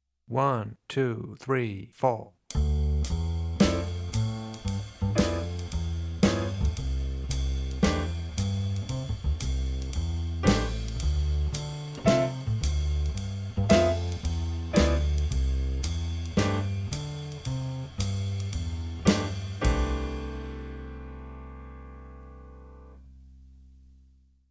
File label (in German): Rhytmuspattern, Klavier; Die Jazzmethode für Klavier (1)